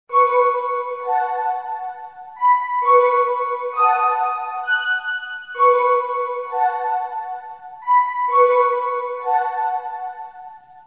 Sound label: sms ringtone